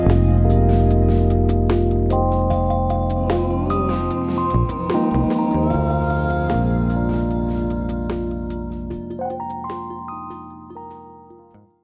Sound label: Rhodes and Voice, Pads; BB 2HTC Samples Vol 4